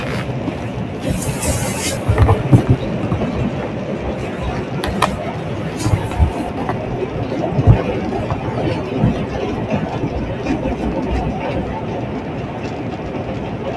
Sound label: rain